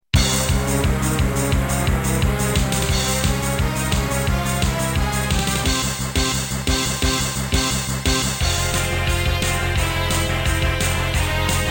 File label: music onslaught